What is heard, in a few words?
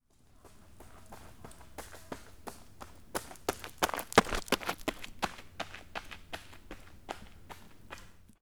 Running, baseball; FOOT